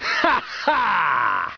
haha

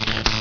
weld

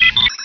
beep

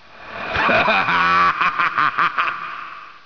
laugh

